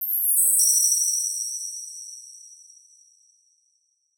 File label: fairy dust; soundscrate